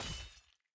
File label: Attack Axe